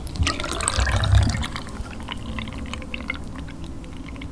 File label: water draining down the pipe